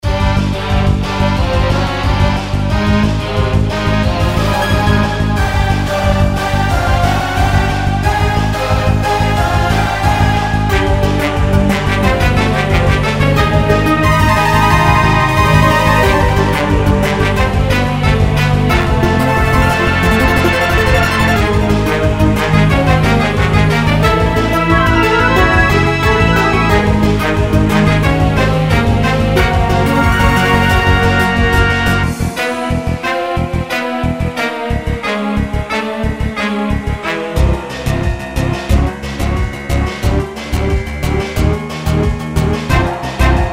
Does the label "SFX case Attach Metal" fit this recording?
No